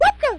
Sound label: hurt